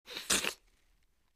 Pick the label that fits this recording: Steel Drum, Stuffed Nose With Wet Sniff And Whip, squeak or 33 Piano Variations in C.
Stuffed Nose With Wet Sniff And Whip